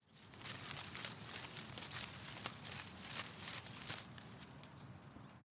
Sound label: leaves; soundscrate